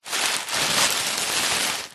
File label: foley plastic bag thick reusable handling rustle short